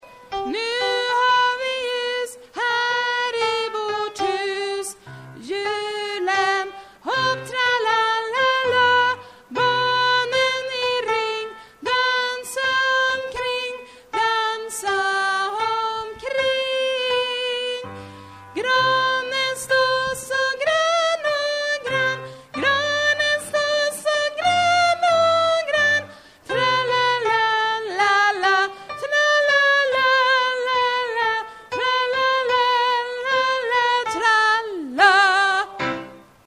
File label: tenor